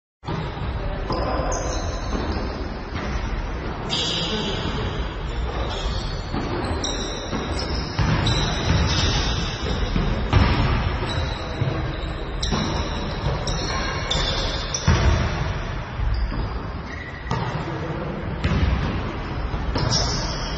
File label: basketball practice match shoes squeaks